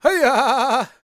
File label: powwow, World Voices, Lakota; Spectrasonics Vocal Planet CD5